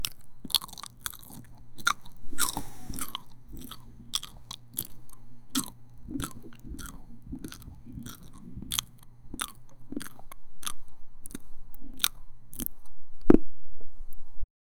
slowly chewing french fri, restaurant

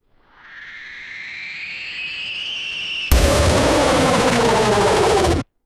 sound when model rocket, rocket

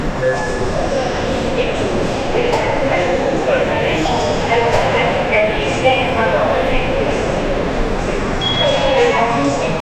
airport ambience

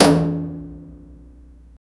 bulb blast

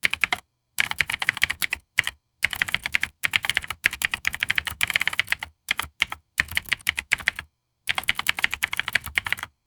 keyboard typing slowly

